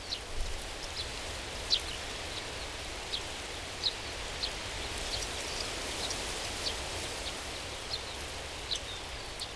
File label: ambi way; Mafie 1